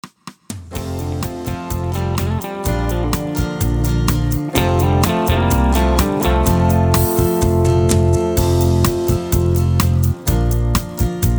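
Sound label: Instrumental Low Leads background vocals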